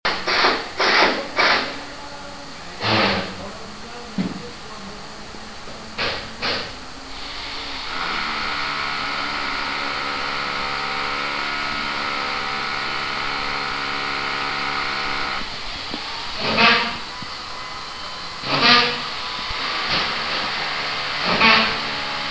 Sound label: Construction Noise